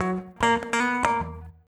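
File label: guitar, Drum & Bass, 145 BPM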